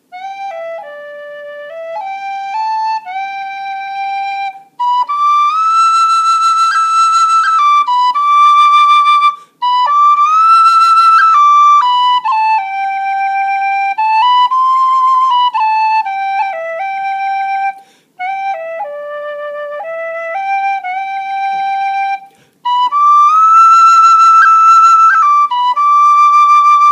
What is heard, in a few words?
IRISH WHISTLE Slow Air; Folk